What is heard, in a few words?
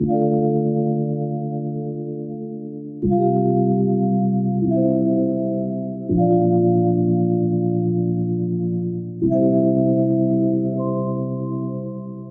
Downcast Chords - Dmin 78 bpm; MIDI Clips; Tonal; Motifs; Ableton; Factory Packs; Mood Reel